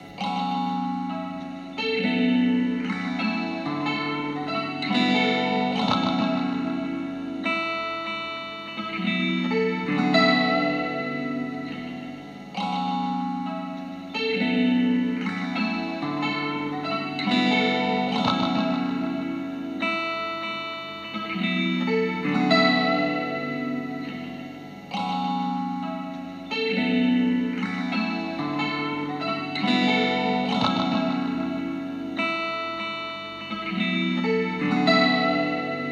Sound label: percussiveness